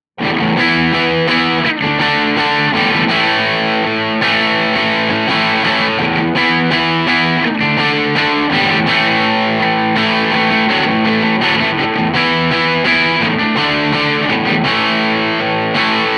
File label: crunch; jensentone